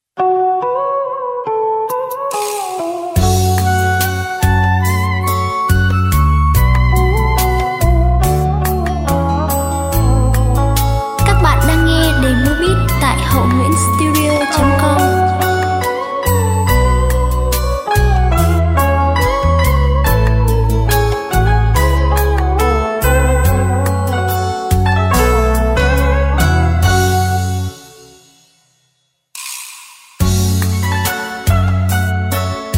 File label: beat, playback